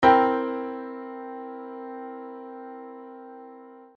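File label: shell voicings 4th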